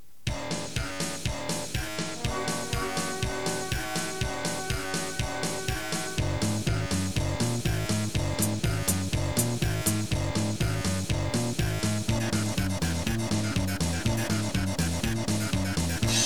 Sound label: Instrumentals